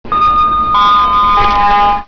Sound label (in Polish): odjazd; cstrike; de stacja